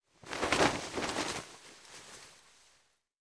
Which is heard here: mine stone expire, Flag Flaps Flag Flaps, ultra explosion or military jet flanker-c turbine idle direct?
Flag Flaps Flag Flaps